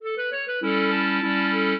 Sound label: clarinet; Mozart